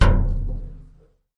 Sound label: hit, metal